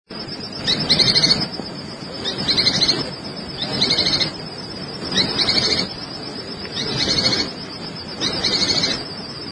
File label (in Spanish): voz pijuí plomizo